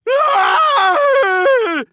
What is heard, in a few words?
scream